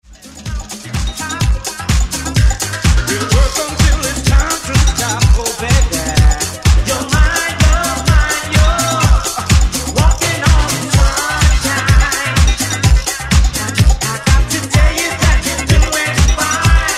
Disco Mix

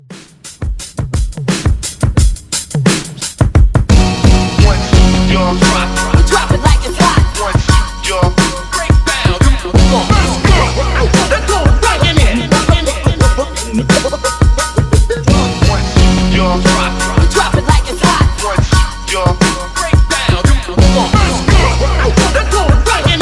Throwback Scratch Hype 87

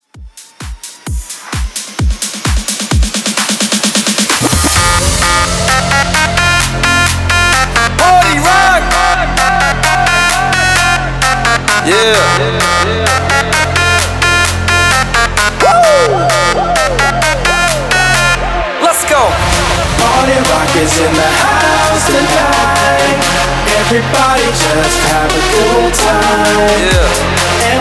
Bootleg 130 BPM

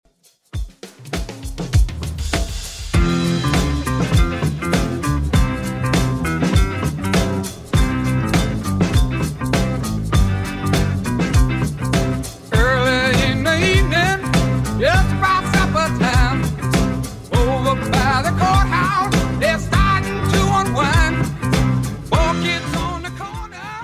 60s Redrum 100bpm

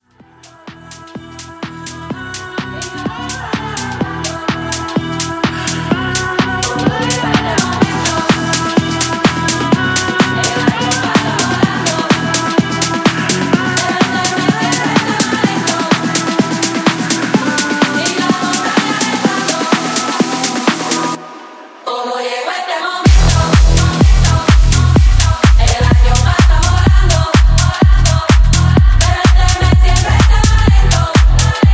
Tech House Intro; Remixfreakz

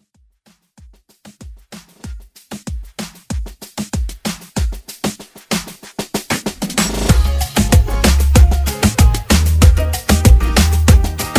Reggaeton Redrum

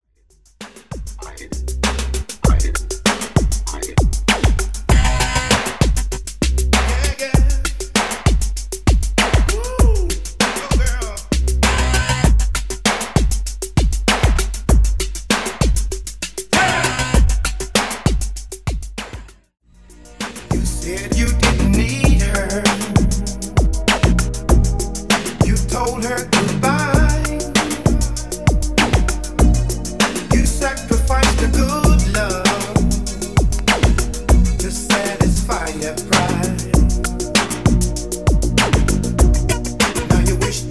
Re Drum 98bpm; remixplanet

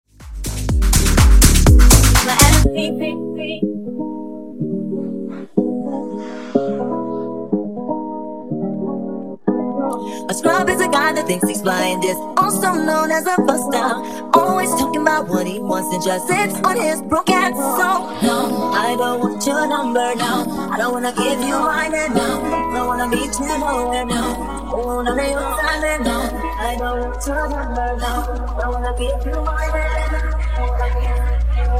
Funk Edit 123Bpm

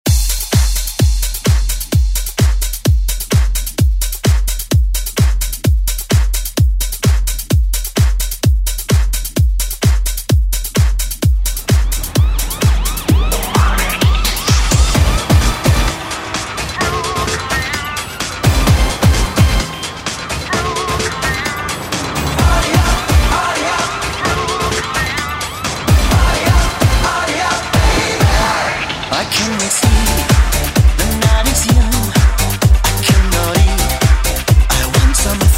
Re Drum; DJ Mhark

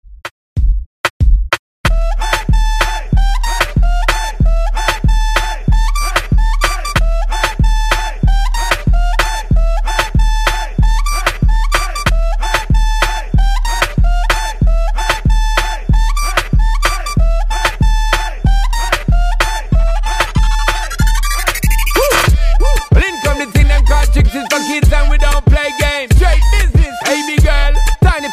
Top40; Redrum

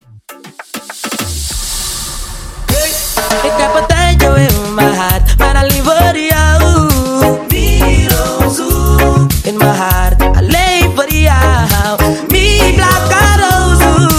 Moombah Twerk Reggae Cut Intro 100 BPM

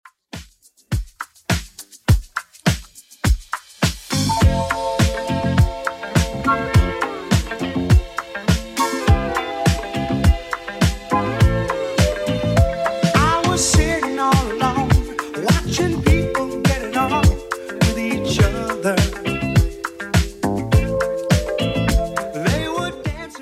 80s Redrum 103bpm

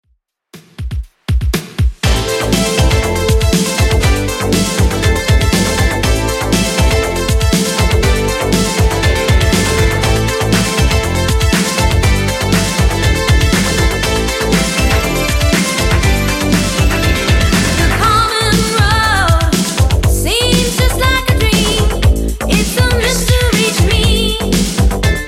80s Redrum 120bpm

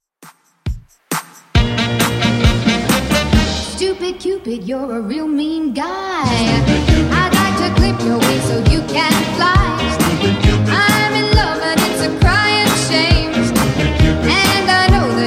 50s Redrum 135bpm CLEAN

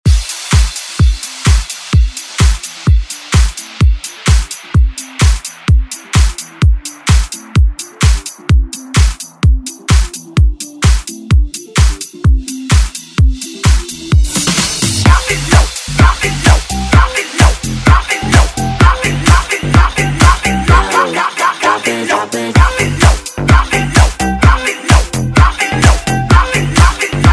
Partybreak

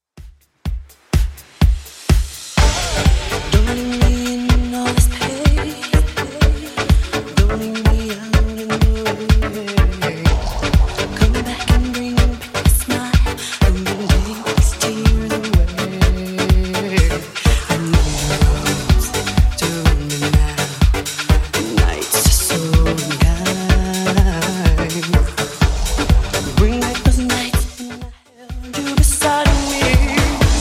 90s Dance Redrum 125bpm

